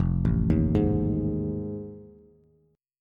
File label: F 7th Sharp 9th, Bass; F7#9 arpeggiated